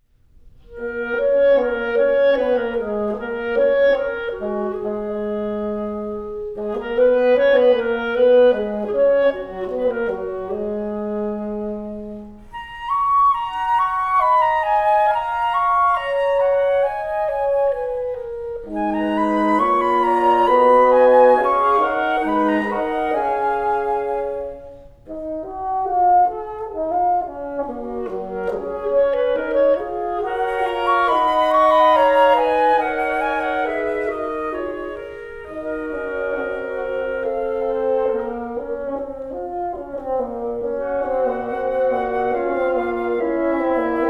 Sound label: suite for woodwind quartet introduction